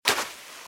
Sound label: Footsteps Sand Dry Walk Left; RadwinIsland